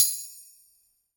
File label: Tambourine